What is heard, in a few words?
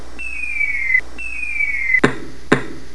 hanabi